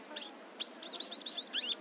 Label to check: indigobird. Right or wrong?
Right